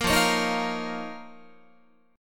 F 7th Suspended 4th Sharp 5th F down Guitar

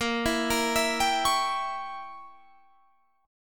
Bb Diminished 7th Bb Guitar Standard; arpeggiated; JGuitar

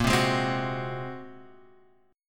Bb 7th Suspended 2nd Sharp 5th Bb; Bb7sus2#5; strummed; x,x,x,3,0,3,2,3; JGuitar

